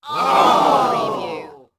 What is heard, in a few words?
Crowd Oh